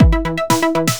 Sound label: beat